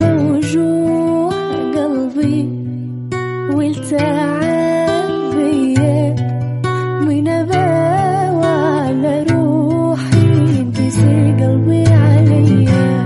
turkish arabic ringtone

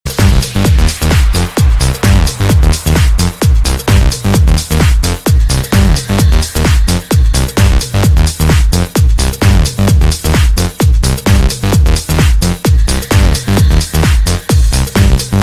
bass illegal mix ringtone

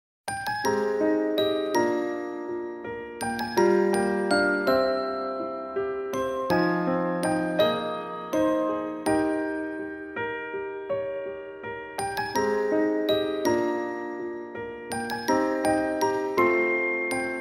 romantic tone ringtone

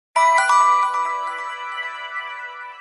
3d bell sound ringtone

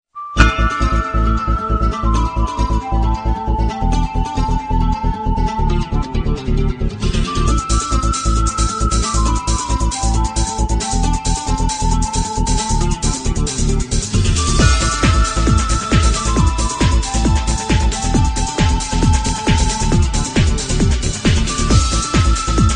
mexican village ringtone

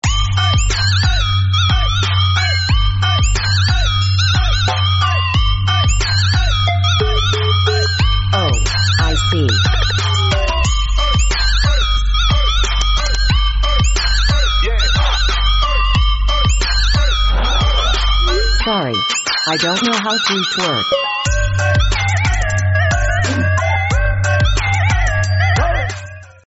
iphone siri mix ringtone

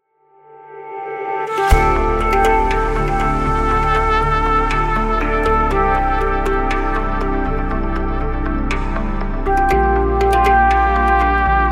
flute ringtone

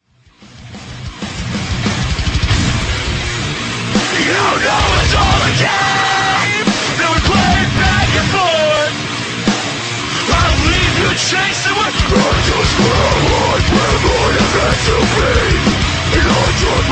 pop ringtone

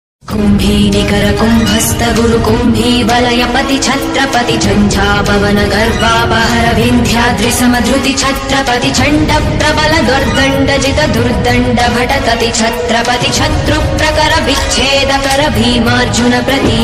sanskrit ringtone; shivaji maharaj

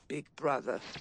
norwegian big tone ringtone

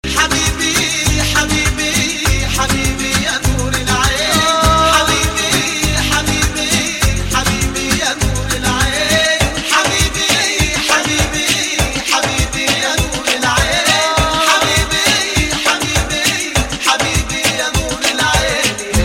habibi arabic ringtone

